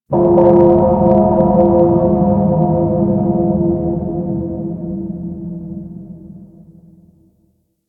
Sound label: Primordial Bell; Ambience